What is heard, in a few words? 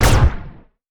explosion plasma; Epic Toon FX